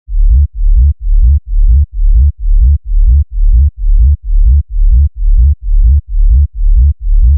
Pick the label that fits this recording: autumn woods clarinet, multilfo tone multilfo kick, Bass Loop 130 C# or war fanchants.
Bass Loop 130 C#